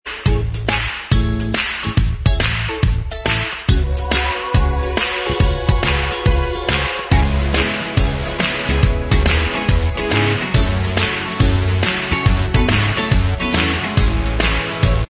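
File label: instrumental track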